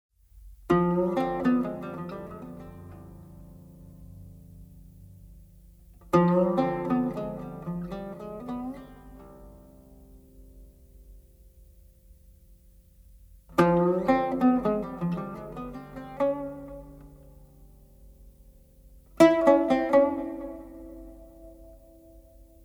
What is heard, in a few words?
Qowl in Chahargah; Qowl o Qazal